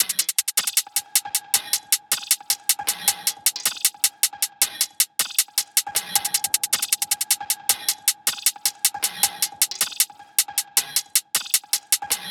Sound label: tops 156, Drum Sample Pack, Loops, Top Loops; SD SEISMIC; Slate